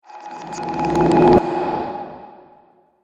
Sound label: Trailer Motion Picture